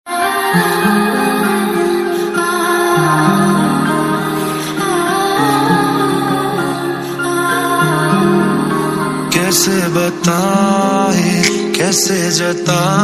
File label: sad background voice bollywood ringtone